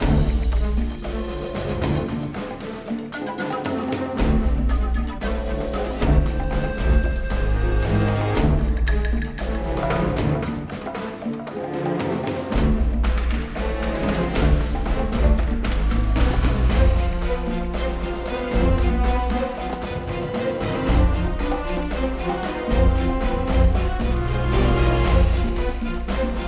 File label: Shanty Action Low